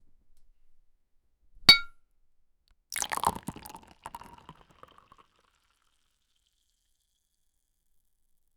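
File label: bottle opening and pouring, glassware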